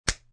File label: Slap, Quick slap with hand.; Hitting; Human